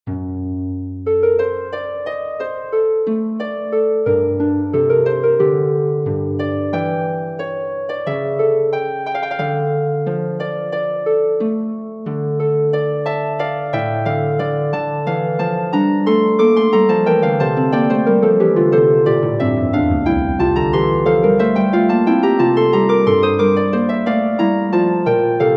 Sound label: recitative for harp; bach johann sebastian; und wiewohl viel falsche zeugen herzutraten